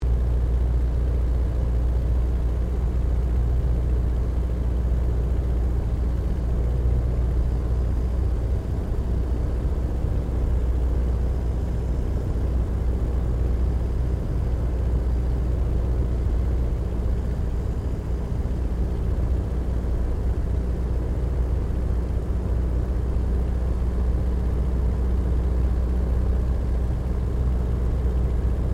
AIRCRAFT 1916 SOPWITH PUP REPLICA FLYING INTERIOR STEREO; Airplanes; Vehicles; Replica of a 1916 Sopwith Pup